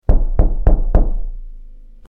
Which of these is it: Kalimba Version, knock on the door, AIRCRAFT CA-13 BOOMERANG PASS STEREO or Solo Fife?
knock on the door